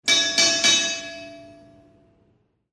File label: BELL FIGHT